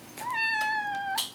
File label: Cat Meows - Food Time; Micro Pack